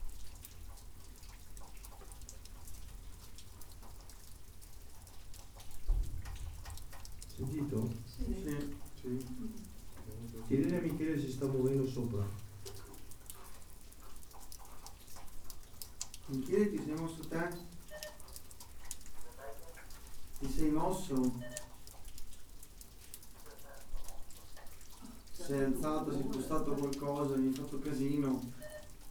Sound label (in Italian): colpo sordo non convenzional interno della struttura in muratura della sala scavi